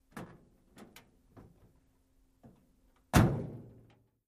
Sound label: Fire Truck Door Med